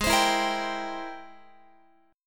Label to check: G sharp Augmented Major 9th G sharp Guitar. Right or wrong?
Right